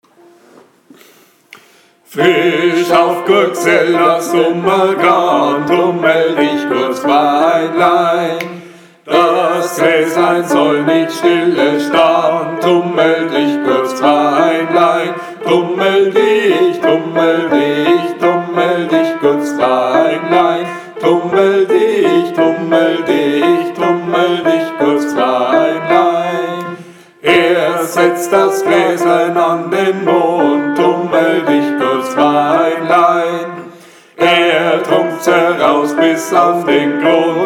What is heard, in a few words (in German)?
BASS; Gesang; Der Tummler